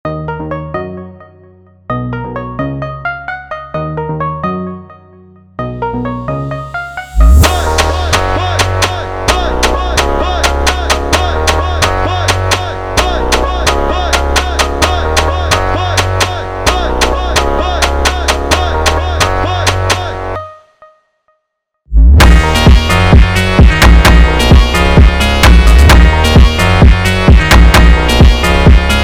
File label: Montagem, Phonk Mashup; funk